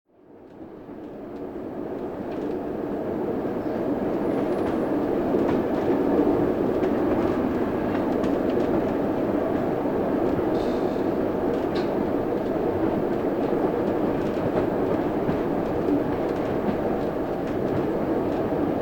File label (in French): Bruit train